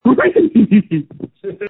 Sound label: screams